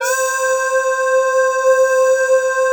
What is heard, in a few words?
PAD C4; Q Up Arts; Dream Experience